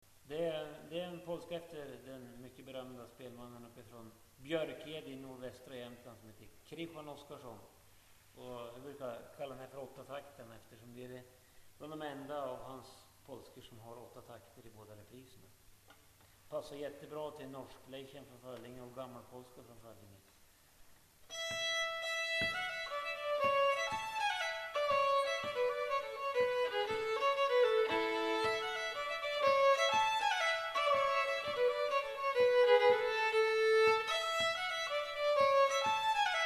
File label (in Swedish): Polska åtta taktarn Solo; Lapp Nils